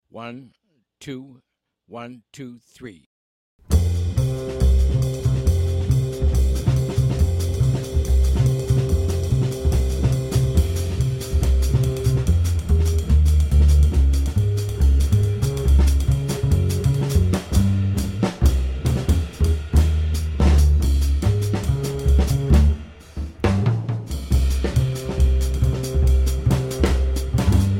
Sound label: bass drums only, jazz